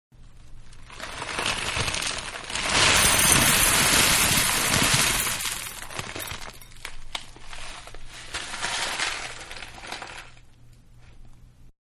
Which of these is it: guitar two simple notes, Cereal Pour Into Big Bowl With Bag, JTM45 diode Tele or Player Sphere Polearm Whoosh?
Cereal Pour Into Big Bowl With Bag